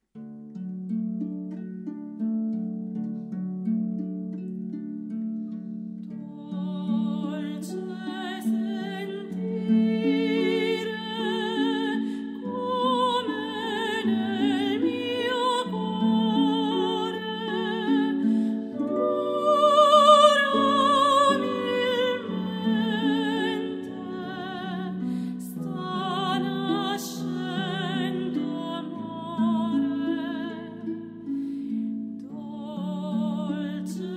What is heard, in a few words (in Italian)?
arpa soprano